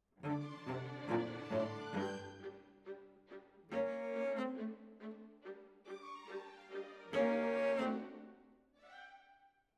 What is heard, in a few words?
Cellos